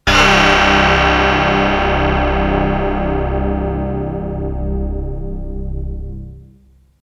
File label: laser harp